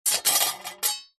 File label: warfare sword small set down on hard surface